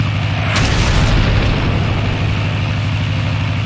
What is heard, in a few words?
bomber Bomb Blast